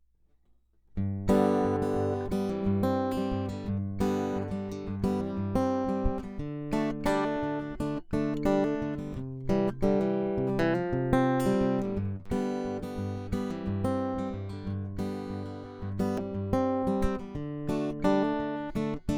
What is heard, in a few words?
Fingerstyle Pickup